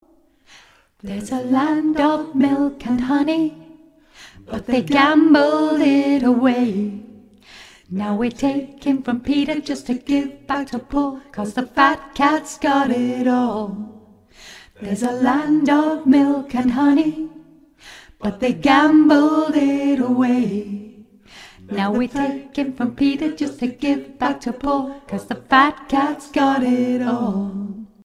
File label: Alt, choir